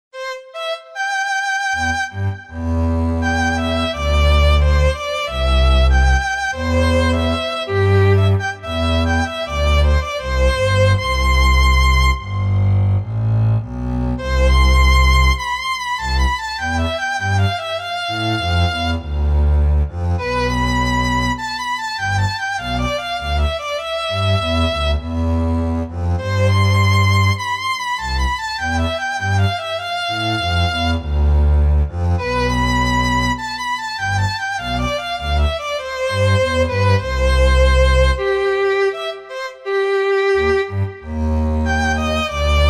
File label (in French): petit duo en ut pour violon et contrebasse; aubert francois